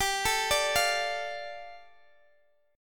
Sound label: G 7th Suspended 2nd, Guitar DADGAD